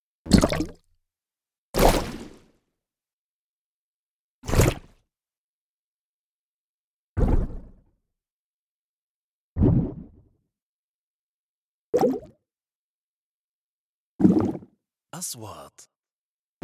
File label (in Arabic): نغمة اندفاع الكتروني سريع; transitions